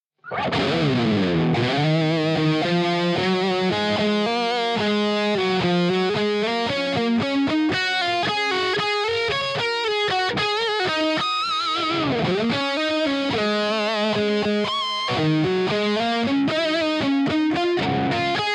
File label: Dist Bridge, electric guitars; Gusta EX All Black MOO Big Ass Signature